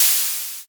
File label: air release